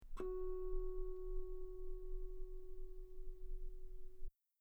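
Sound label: guitar F sharp 5 very long piano harmonics; bananenkeyboard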